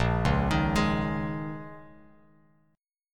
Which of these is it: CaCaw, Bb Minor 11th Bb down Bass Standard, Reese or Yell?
Bb Minor 11th Bb down Bass Standard